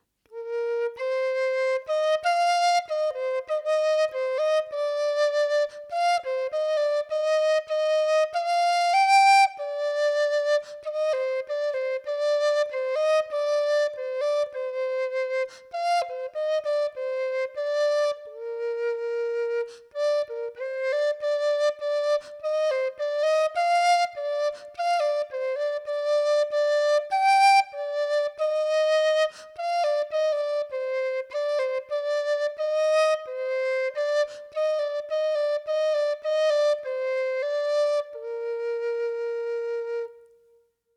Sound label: whistle B ash